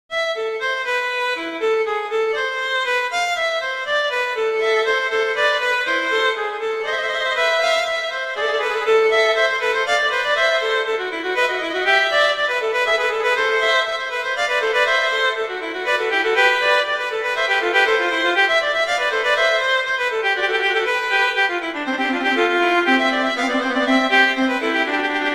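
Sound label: Vivace moderato, Viola Cello; Telemann; Canonic; Sonata 4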